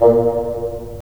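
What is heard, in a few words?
wind bassoon; LA Composer vol. 3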